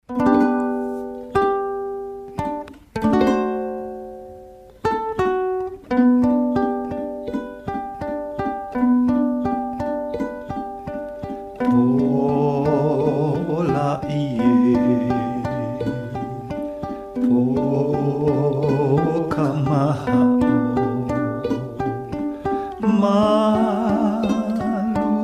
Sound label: Silent Night, ukulele, key of G, song in Hawaiian